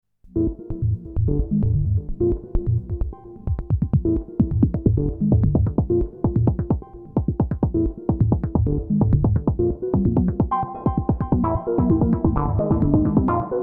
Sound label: Moog Sound Studio Patch Workouts Subharmonicon DFAM